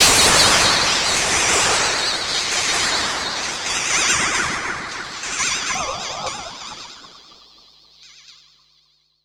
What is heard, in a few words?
Ring Mod & Delay, contact mic Cymbal